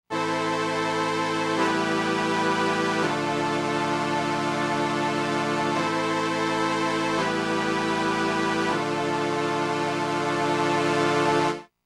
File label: S750 ARP Solina